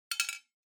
Drawing Machine Garapon, Drop

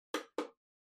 PC Operating Noise Far Button

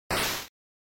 NES General Noise Phrase

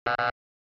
OS Sound Notification

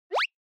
Anime Motion Dry

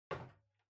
Portable Stereo Far Put

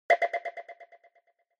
Onoma Sigh High Fast

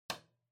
Light Switch Box Rocker Wall Mid

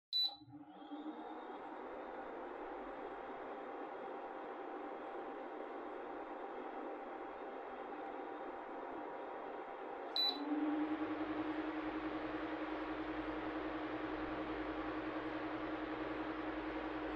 Electric Fan Far All

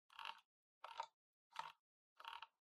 PC Mouse Far Scroll

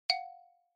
Xylophone Multi

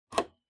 PC Hardware USB Plug In

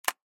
Cell Phone Hardware Close

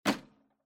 Public Phone Receiver Grab

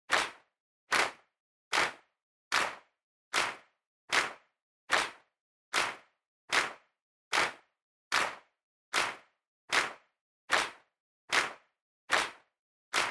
Handclap, Dry Slow

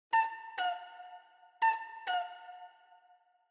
Cuckoo Clock Denoise Reverb Mid

